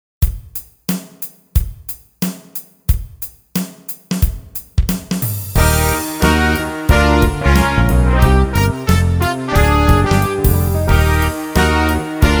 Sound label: Instrumental